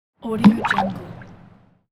pebble dropped into water

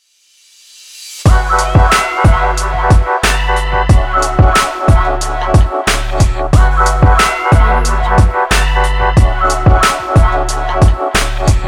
the hip hop

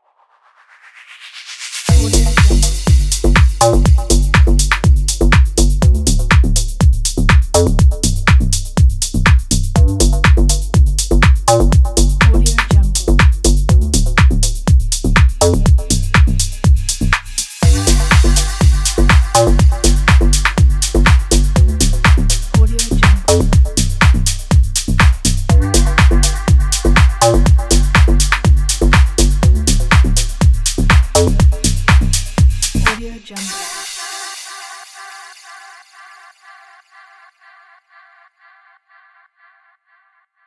deep house

